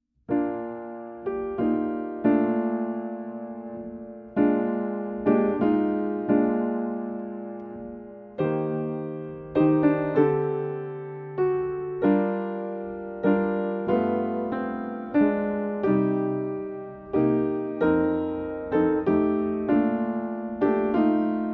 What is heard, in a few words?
Stille Nacht Accompaniment